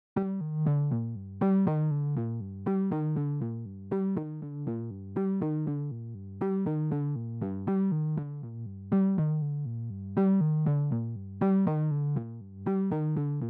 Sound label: Synths